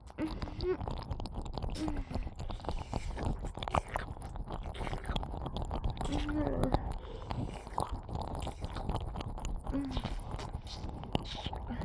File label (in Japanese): フェラ＋れろれろ両耳舐め; 3WAYループ; オナサポ